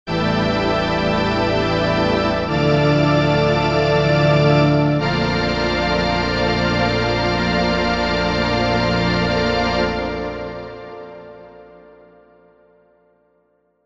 logan chords; stringer

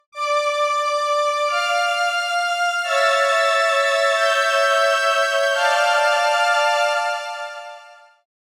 Hi Strings, Jupiter8; OPXPROII; opxpro2

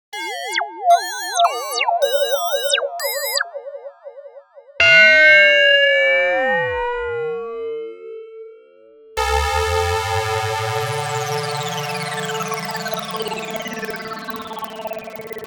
opx effects

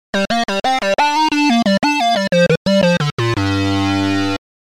sync solo high; reaktor; obx

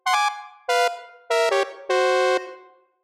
Horn Tritone, Jupiter8; OPXPROII; opxpro2

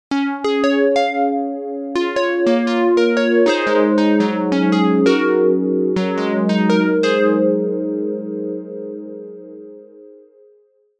stereo harp; opxpro

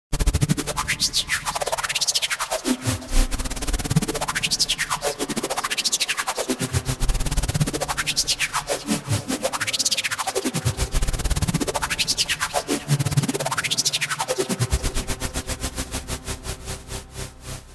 odyssey autogate; opxpro

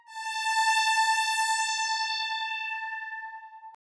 Bright Strings; opxpro2; Riddle

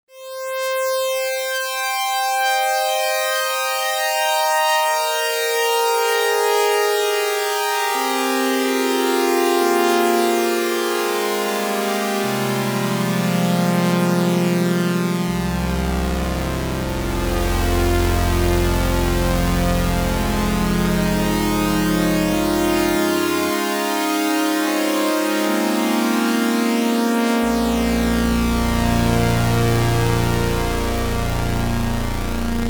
ober string

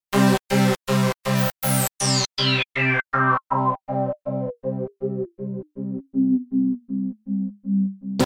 pulsemod; reaktor; obx

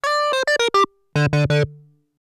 Syncsolo; opxpro2; Riddle

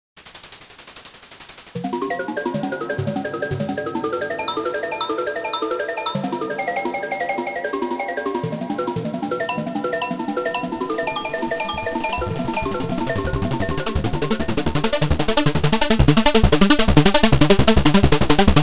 arpeggio; obx

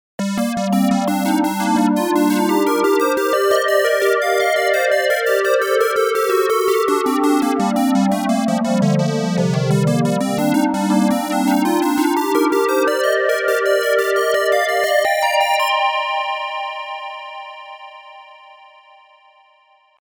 stereo pulse

